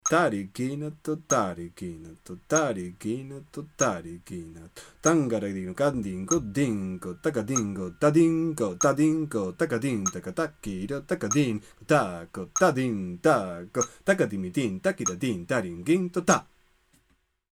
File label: gati bhedam khanda jathi